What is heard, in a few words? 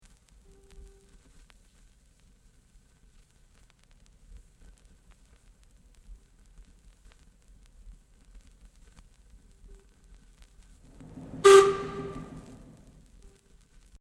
Whistle